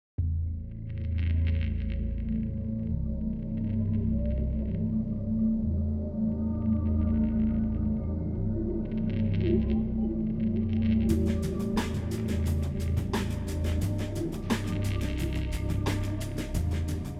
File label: Event Suspense Light